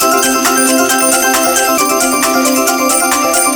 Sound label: count up; Amatic; Hot Diamonds